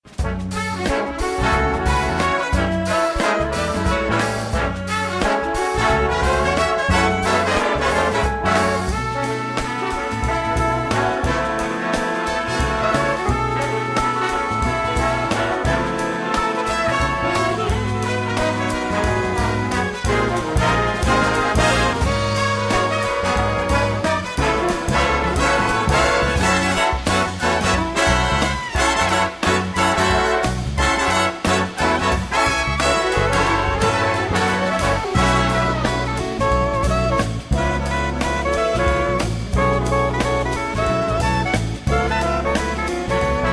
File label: Funk